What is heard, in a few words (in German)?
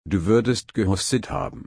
husten